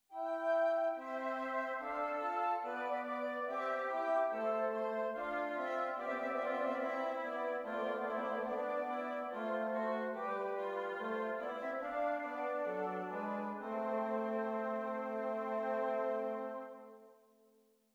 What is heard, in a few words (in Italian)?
esercizi di doppio contrappunto nella decima